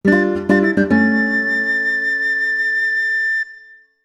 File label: Fanfare Small Item; Bard; "Get Item" and "Get Small Item" fanfares (Bard versions)